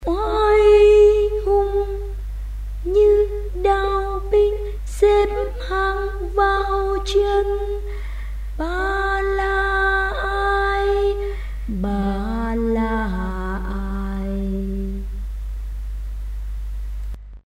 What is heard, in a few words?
alto